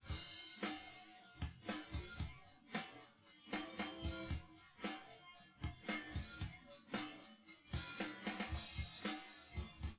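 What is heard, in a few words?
with drums, synth conv, 250ms, 5cm; SiSEC10; underdetermined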